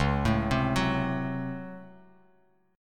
Db Major 7th Suspended 4th Sharp 5th Db Bass Standard; 9,12,10,11; arpeggiated; chord shape